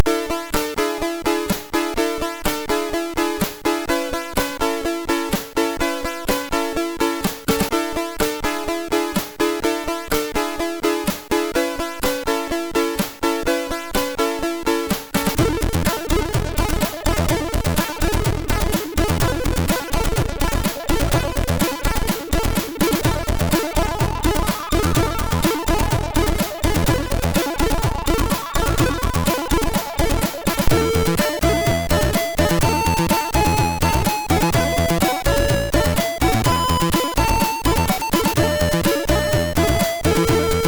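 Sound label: Musical Dance, MOS 6581 SID chip; JVD; hvsc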